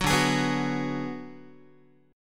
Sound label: D 6th Flat 5th D down Guitar, chord; D6b5 strummed; JGuitar